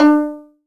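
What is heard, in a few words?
violin pizzicato D 3; AKAI S-Series CD-ROM Sound Library VOL-7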